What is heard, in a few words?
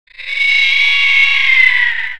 Harpy; Hellboy